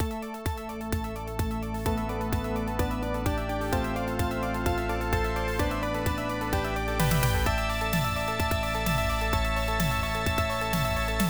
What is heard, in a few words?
Loading BGM; Motos; x68000